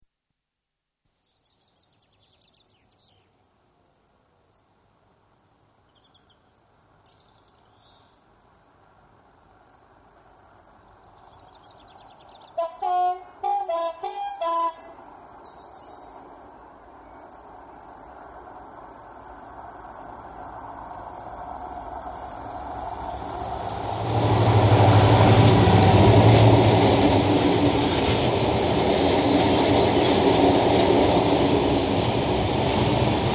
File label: Diesel Heavy Goods Train passes under bridge left to right with hooter; BBC